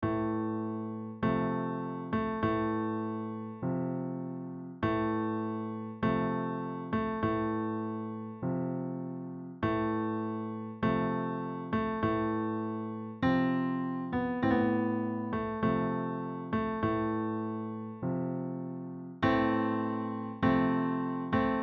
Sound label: Funeral March, Classical; TS Sounds